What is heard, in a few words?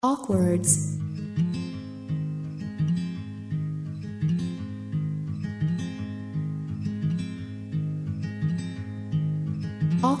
instrumental